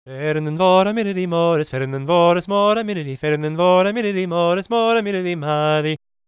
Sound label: GAELIC, World Voices; ILIO; Vocal Planet